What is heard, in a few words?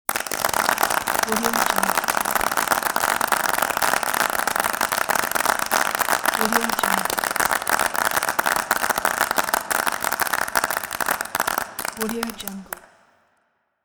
hall applause